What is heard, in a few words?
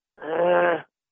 Ehhhh